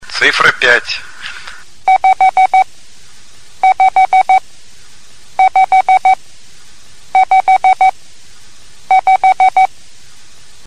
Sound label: CW